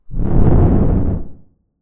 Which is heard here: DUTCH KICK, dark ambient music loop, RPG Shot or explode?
explode